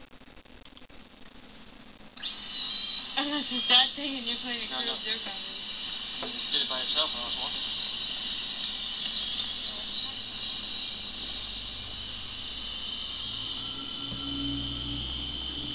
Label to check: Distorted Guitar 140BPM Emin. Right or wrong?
Wrong